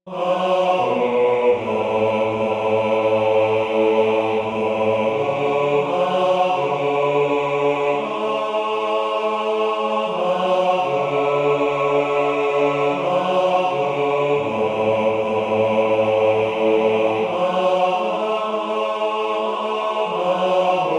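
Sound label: Swallows bass, wisdomchant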